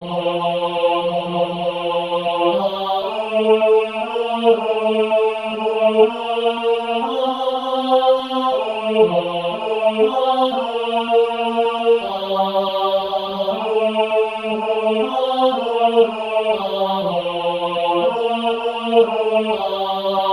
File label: Tenor thank you